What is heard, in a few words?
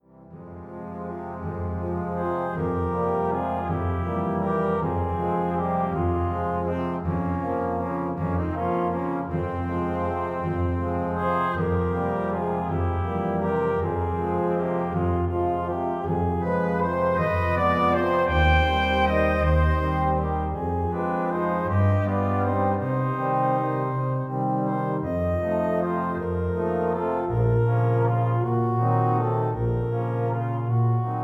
Venetian Boat Song